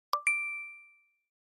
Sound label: mail